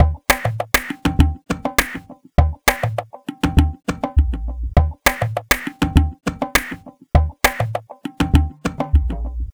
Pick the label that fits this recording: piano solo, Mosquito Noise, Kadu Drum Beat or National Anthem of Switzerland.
Kadu Drum Beat